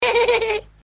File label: spank